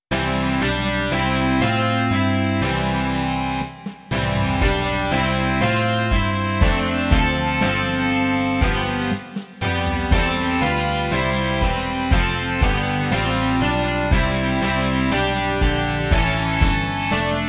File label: rock